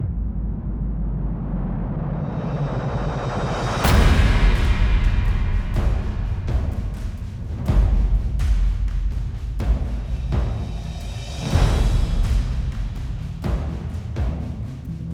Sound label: synth only